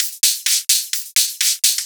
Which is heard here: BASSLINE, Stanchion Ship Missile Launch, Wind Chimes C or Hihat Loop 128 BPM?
Hihat Loop 128 BPM